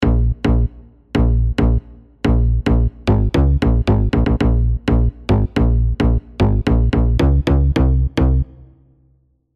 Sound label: BASSES